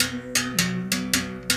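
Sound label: 79 bass berimbau phrase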